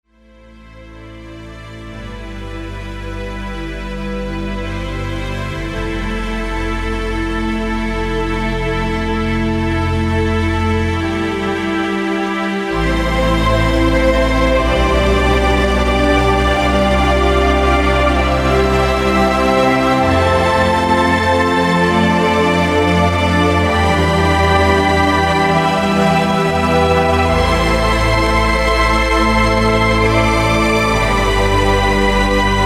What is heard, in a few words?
strings